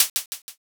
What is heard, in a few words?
hihat